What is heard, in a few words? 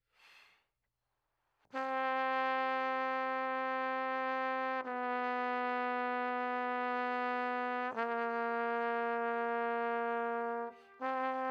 Trombone